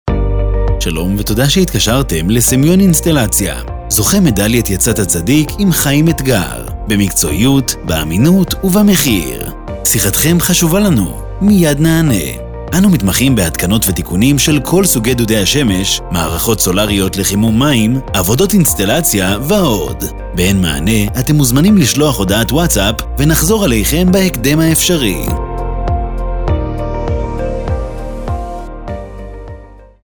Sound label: Music On Hold; סמיון אינסטלציה